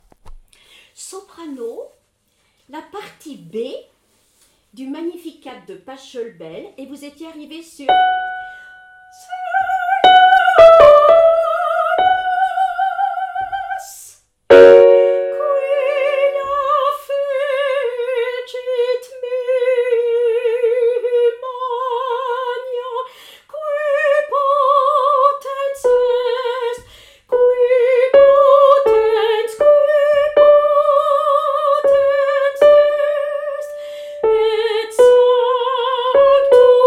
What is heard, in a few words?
Soprano; Magnificat Pachelbel; Ensemble Vocal Chanteval